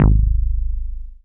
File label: BAS FM DX-7